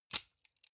sunglasses plastic grab medium

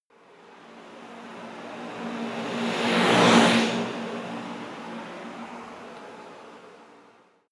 fly by flying car

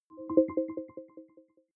button echo chord arpeggio

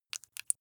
flesh wet greasy squish

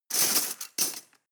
cutlery teaspoons drawer grab hard

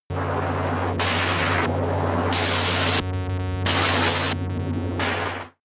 scatter vent buzz synth low

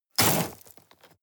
shovel dig impact

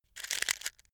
matches crunch

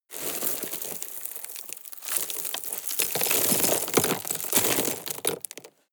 drop rock debris soft

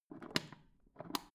foot switch turn on off slow

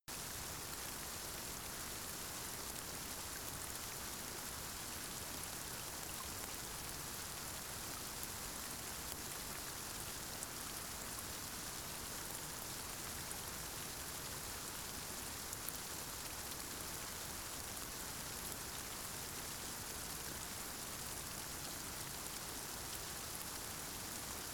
generic rain loop soft

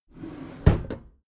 packed drawer smooth close regular halfway

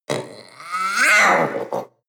panther young vocal scream short

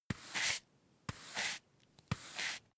broom hand sweep dustpan sequence

